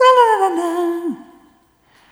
Rhythmic A

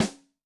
Brassy, Yamaha DTXpress-II snare

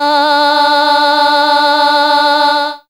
female ah; AMG - Vince Clarke Lucky Bastard

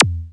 SKWEEK, DANCE

